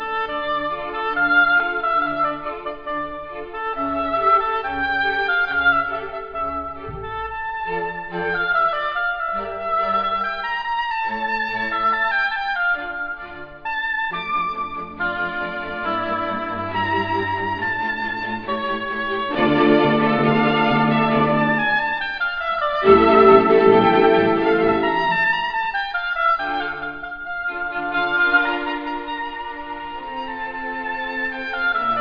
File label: OBOE